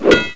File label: pick hit; wanted; weapons